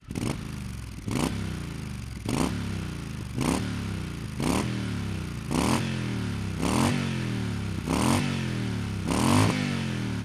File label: remus custom slip on exhaust triumph thunderbird lt; motorcycle